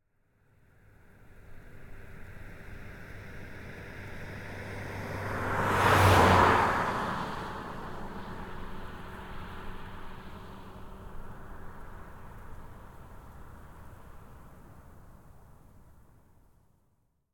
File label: Mazda 6 III 2.0 Skyactive G exterior passby steady rpm whoosh; stereo ORTF; Soundholder